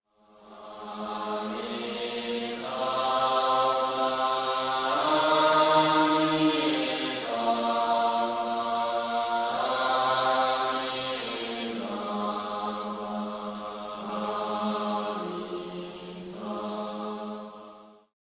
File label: woman